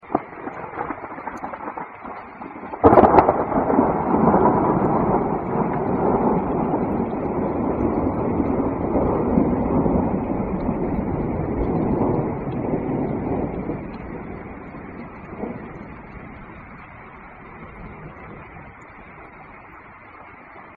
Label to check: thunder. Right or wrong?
Right